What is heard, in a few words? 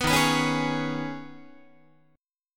D sharp 7th Suspended 4th Sharp 5th, Guitar; D#7sus4#5; strummed